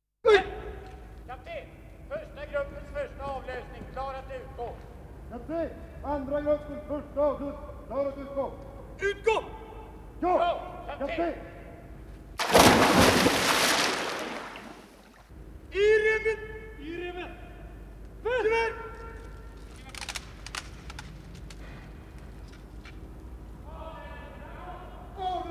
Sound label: A Soundscape of Europe; Firework Edition Records